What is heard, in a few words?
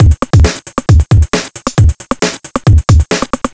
135 Electro Full, Drum Loops; Zero-G; Soundclash Nu Dance Electro; Acidized Wavs; 135bpm; Ghetto Electro